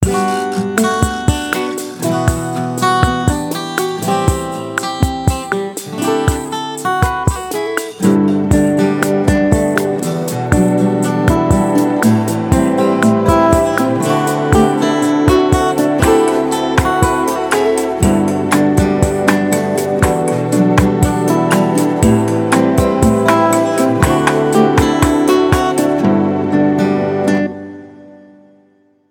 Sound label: Guitar